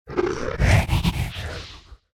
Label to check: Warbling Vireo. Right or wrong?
Wrong